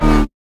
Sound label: Boss Core Hurt; Avie